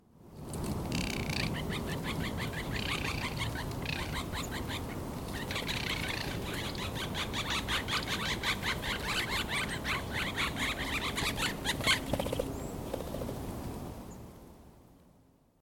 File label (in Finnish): kisailua, linnut